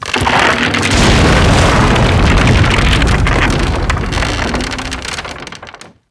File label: destroyed bridge; DAoC